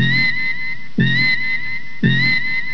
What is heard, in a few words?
MEDSCAN